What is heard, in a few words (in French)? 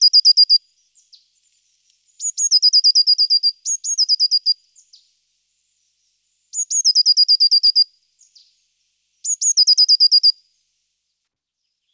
mesange bleue; TOULON; PRESQUILE